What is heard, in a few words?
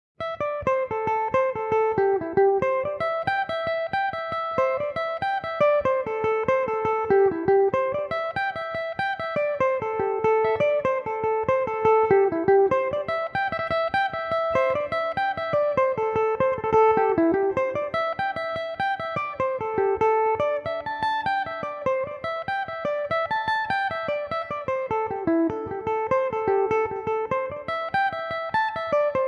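A minor, irish mandolin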